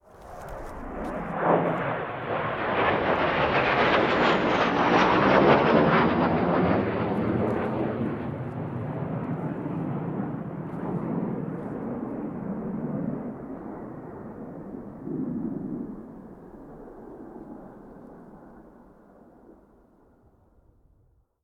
Fighter Plane Avion Chasse

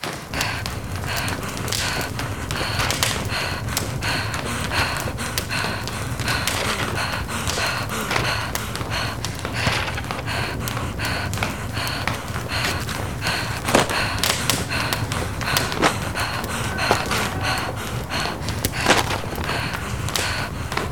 Escape From Building In Fire

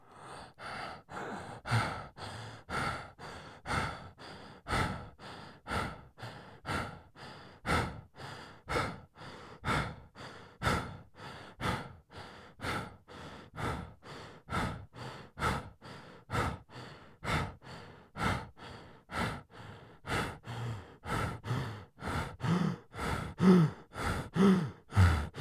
Heavy Gasping Air

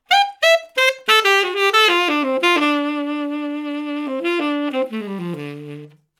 90bpm Hop Skip Jump

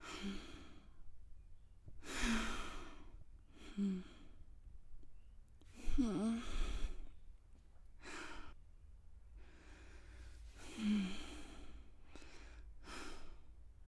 Women Sighing

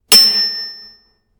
Anime Style Sword

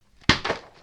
Foley Book Fall Impact

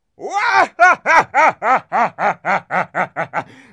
Villain Laughter

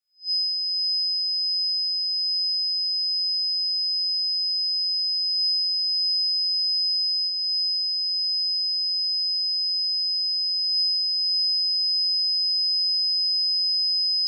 Microphone Feedback

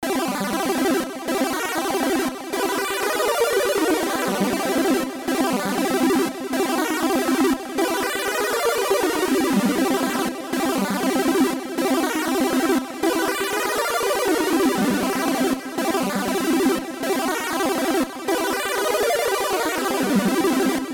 Annoying Arcade Loop

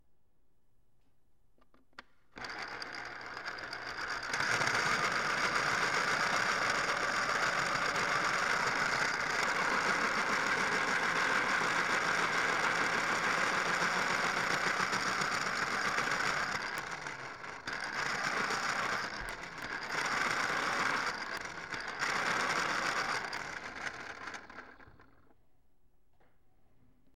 Pencil Sharpener

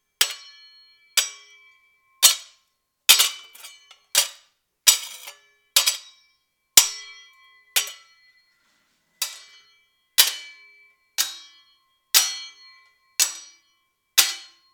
Sword Hit

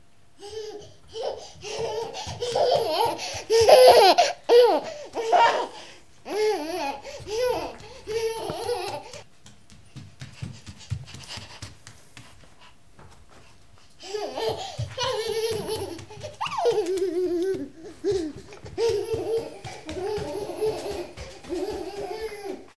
Happy Running Kid

